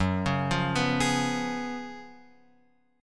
Gb Major 7th Suspended 2nd Suspended 4th Gb down Guitar Open F; GbM7sus2sus4 arpeggiated; JGuitar